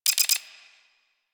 Briefcase Launcher Homing Projectile Warning Locked; Warframe; Weapons; Corpus